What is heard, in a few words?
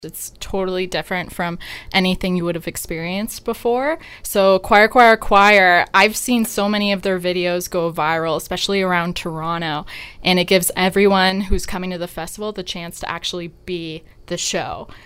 choir